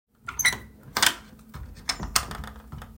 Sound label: unlock door